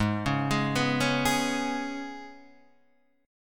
G sharp Minor Double Flat 5th G sharp, Guitar DADGAD; 6,4,6,4,4,6